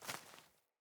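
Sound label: Boot Walk Vegetation, Footsteps; Characters; Enemy; Boots; Sinecure; Melee Grunt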